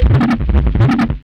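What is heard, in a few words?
Sequencer, Hard & Loud Techno; USB Soundscan vol.01; AKAI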